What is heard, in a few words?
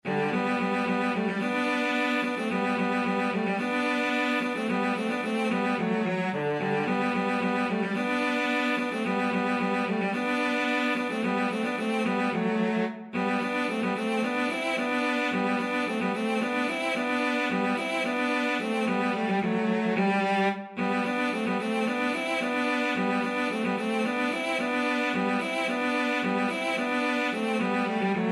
spring longer cello duet